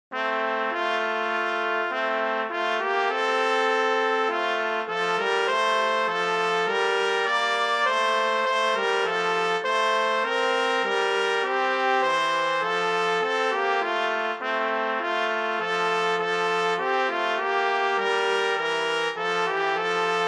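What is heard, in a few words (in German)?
Duett Sankt Martin, Posaune, Trumpet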